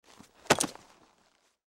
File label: Horse Saddle Put On; Animal; Blastwave